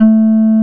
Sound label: OB8 BLOW A2, OBERHEIM OB8, Vintage Keyboards; Big Fish Audio; First Call Vintage Keyboards